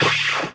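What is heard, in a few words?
impact bullet generic titan shield; Titanfall 2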